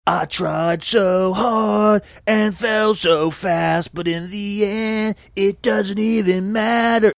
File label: singing i tried so hard and fell so fast